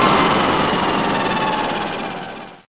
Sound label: spin down